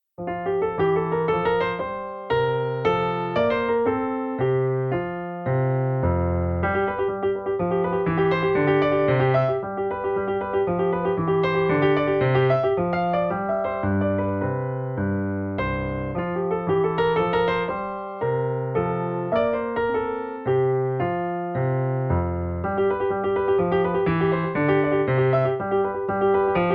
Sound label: Minuet in F